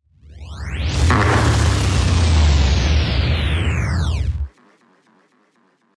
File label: Fart Cat